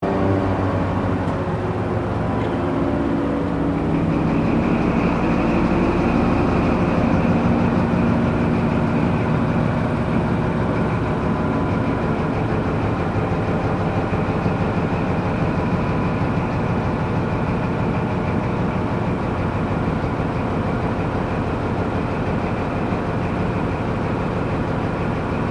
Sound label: Train Melbourne Startup; OVERLANDER; Various Melbourne Trains; Vehicles